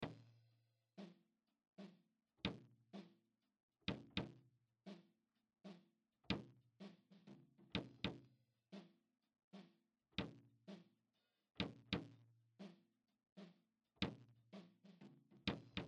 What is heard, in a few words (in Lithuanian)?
Kick trigeris